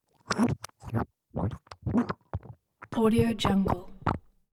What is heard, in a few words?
baby vocal buzz; prosoundfx